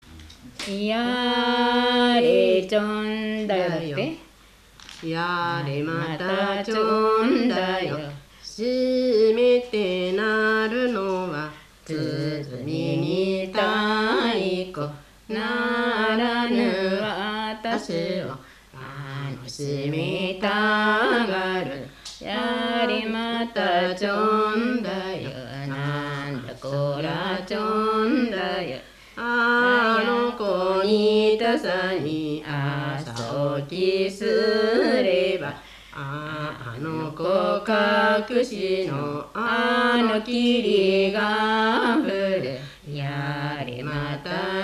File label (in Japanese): takotukiondo, minyou